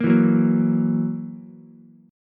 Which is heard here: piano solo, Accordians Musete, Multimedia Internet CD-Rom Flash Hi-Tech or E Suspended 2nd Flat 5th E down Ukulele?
E Suspended 2nd Flat 5th E down Ukulele